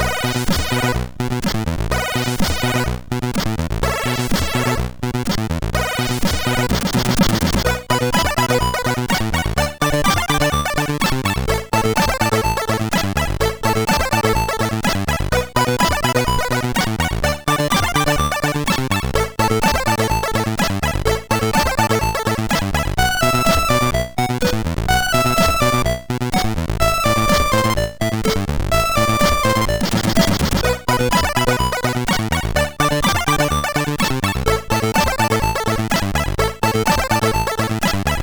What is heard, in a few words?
Party Tune, MOS6581R4